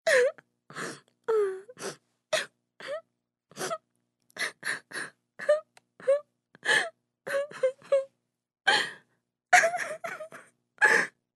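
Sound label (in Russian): Звук плача молодая девушка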